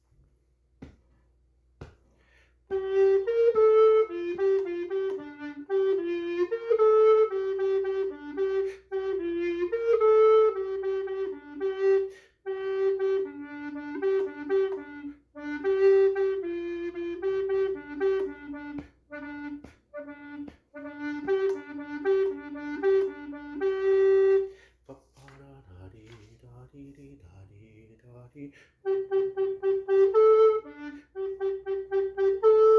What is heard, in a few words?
Bass Parts I J, recorder